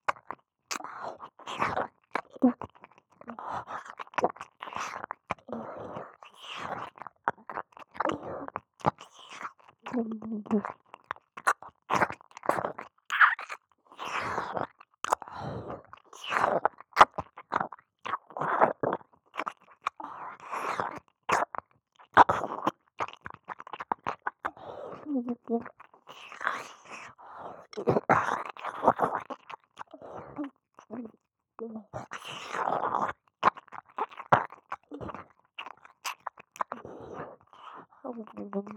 耳舐め; アスティリア

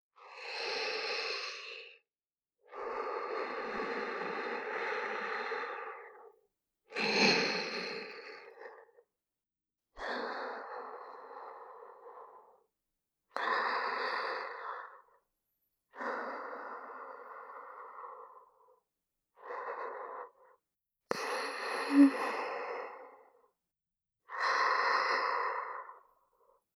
寝息(右); 先輩保健委員の寝息(右); SEあり

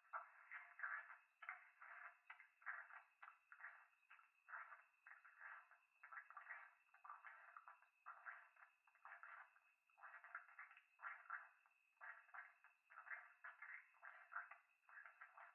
風呂 フェラチオ 山奥 夜

